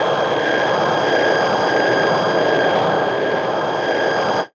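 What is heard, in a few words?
ambient, alien ambi; Best Service ProSamples vol.36; Chillout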